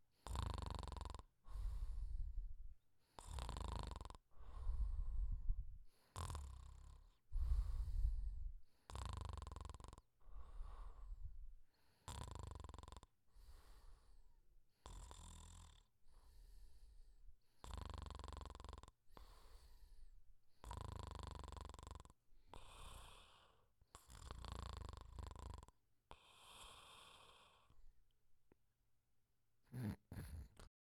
Snoring

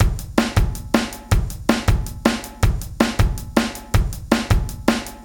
Drum Beat

